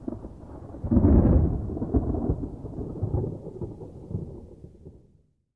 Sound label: thunder claps, close ups